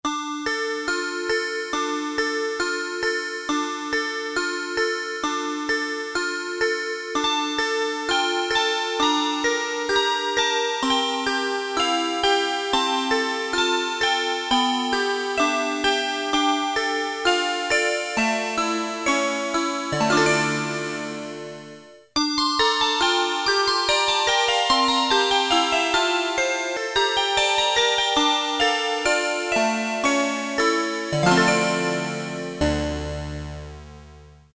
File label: Synth Bells, Casio MT-600; Tones